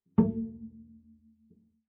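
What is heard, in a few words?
Bass pizzicato fortissimo sul A A2, Double Bass; MIS Pitches - 2014; Strings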